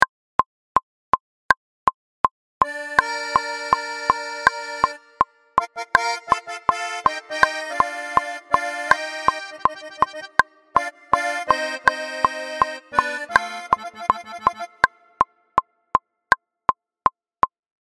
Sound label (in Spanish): ACORD, acordeon norteno